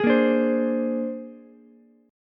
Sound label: Bb 9th, Ukulele; 13,10,10,15; strummed